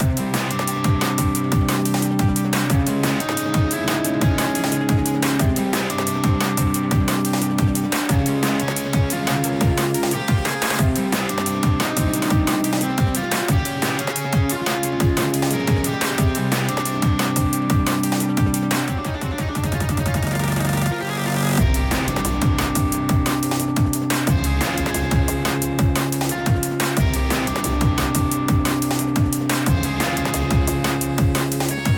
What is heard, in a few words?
BGM Ingame